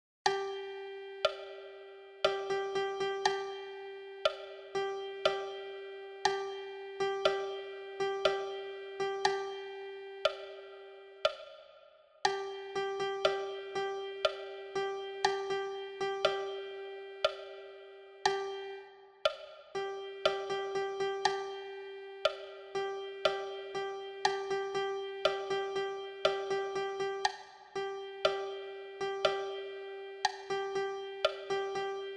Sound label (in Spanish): percusion